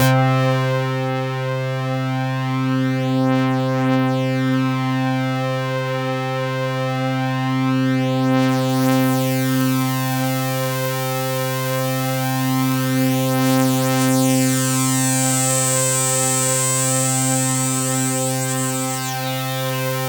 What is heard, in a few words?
POLY SAW, Roland JV 2080, C3